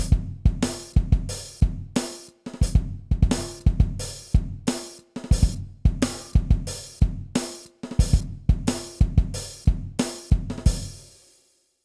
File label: drumloop 90